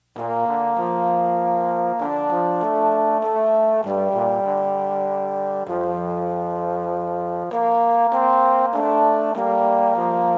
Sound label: O Mio Babbino Caro Two Tbns